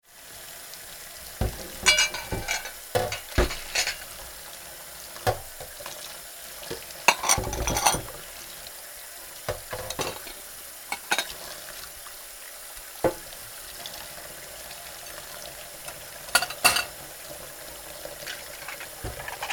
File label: Washing dishes in the sink plates clinking water flowing